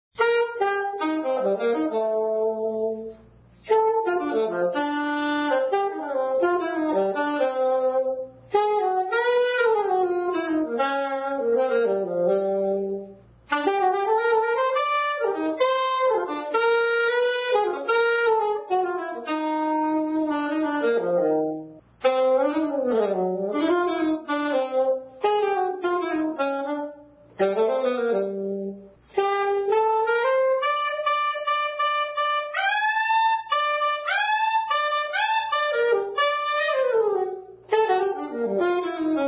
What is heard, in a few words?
Saxophone; Zomboid; Lifestyle